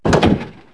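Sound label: knock down; impacts; Wired for Sound Pro CD; Aristosoft, Inc.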